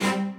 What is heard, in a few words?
strings